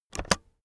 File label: keyboard external space press fast